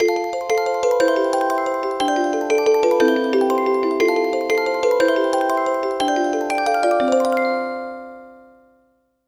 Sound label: GAMEOVER (Game Over); Gradius II